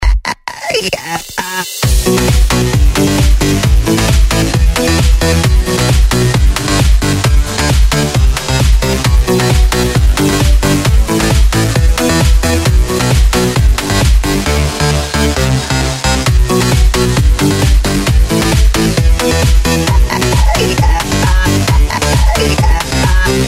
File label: scratch